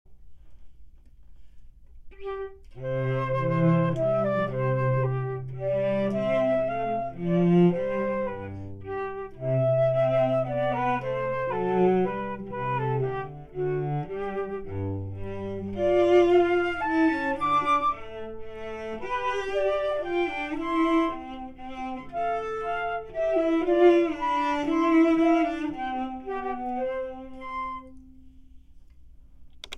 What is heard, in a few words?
Flute Minuet; Haydn